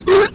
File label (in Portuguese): vomito rapido